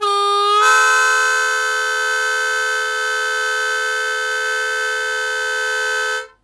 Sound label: Blues Chord Attack D1, Harmonica; Zero G